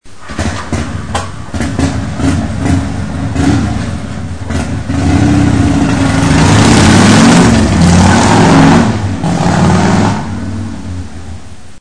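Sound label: car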